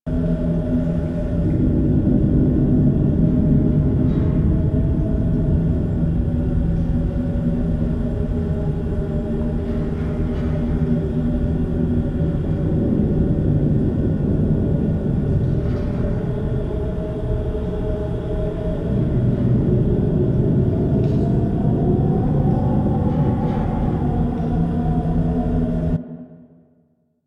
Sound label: Tunnel Amb; Warframe; Ambience; Grineer; Grn Interior